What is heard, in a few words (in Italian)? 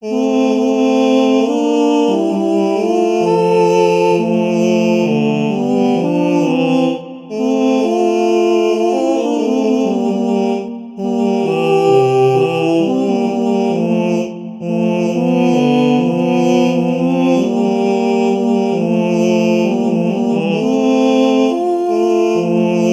Duetto; I Versione